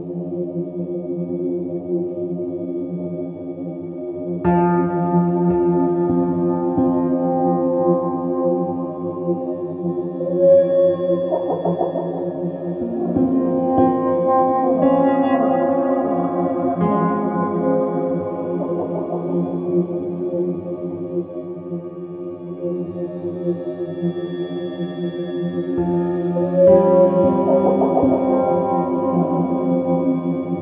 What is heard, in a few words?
Mainloop; Interactive Cosmos; Kosmos